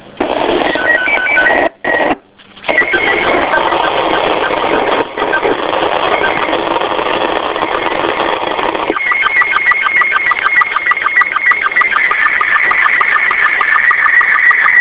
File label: 437mhz550 sstv; arissat-1